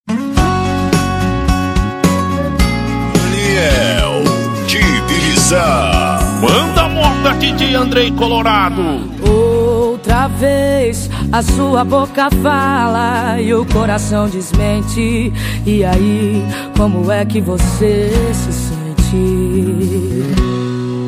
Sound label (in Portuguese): sertanejo com locutores de rodeio